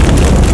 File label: fire; Pokemon Solar Light & Lunar Dark 1.0.1